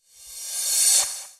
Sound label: Cymbals Reverse Crash; Vengeance Samples